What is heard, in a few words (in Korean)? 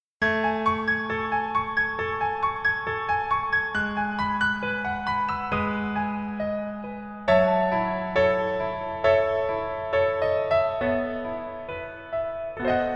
Piano ver 멜로디MR -1키